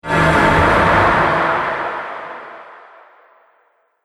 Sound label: Epic Sf Explode Impact; Sci Fi Sound Effects